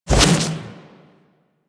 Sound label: Melee Jump Slam Charge, Whooshes; Weapons; Warframe